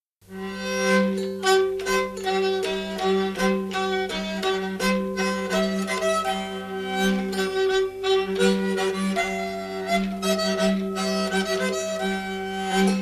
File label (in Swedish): nyckelharpa; Skanklat